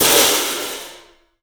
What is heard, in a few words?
CYFX, EFFECT CYMB; 3D Sound; 3D Drums